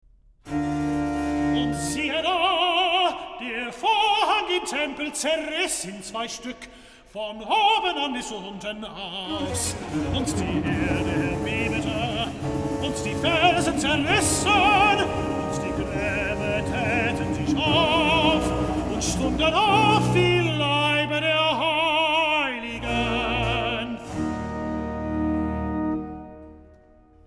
Recitative; Bach, J S; Johannes-Passion, BWV 245, Pt. 2; XLI. Recitative. Und siehe da, der Vorhang im Te

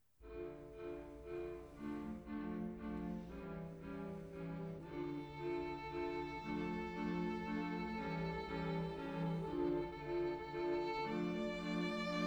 String Quartet in F Major Adagio affettuoso; String Quartet No. 1; Op. 18 No. 1; Ludwig van Beethoven; Beethoven - String Quartets Nos. 1-16

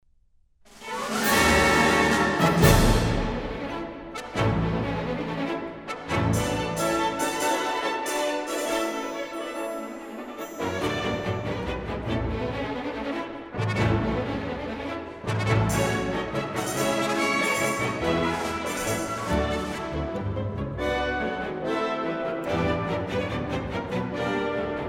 Symphony in A minor; Rachmaninov; Symphony No. 3

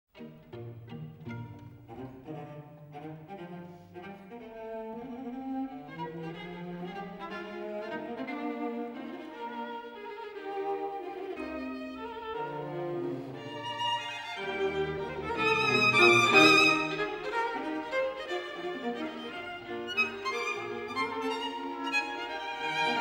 String Quartet in E-Flat Major, Scherzando vivace; String Quartet No. 12; Op. 127; Ludwig van Beethoven; Beethoven - String Quartets Nos. 1-16

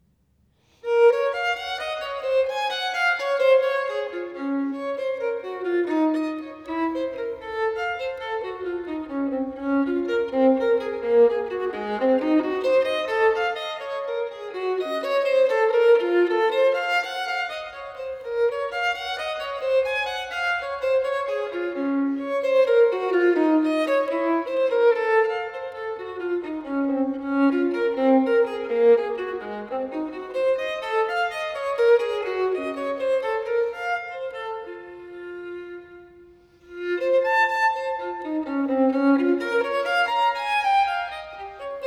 Partita in B minor, Violin Solo

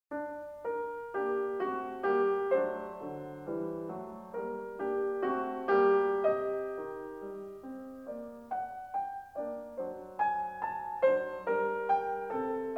Piano Sonata No. 19 in G minor; Wilhelm Kempff; Beethoven; Piano Sonatas

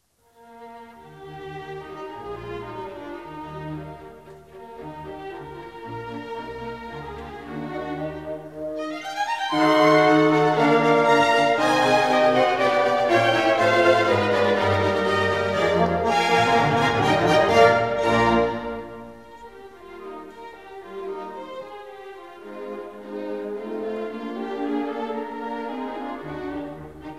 Horn Concerto No. 4 in E flat; Mozart Horn Concertos

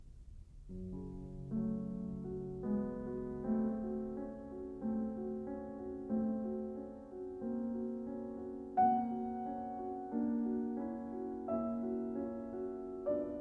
Prelude in F sharp minor, piano; 24 Preludes

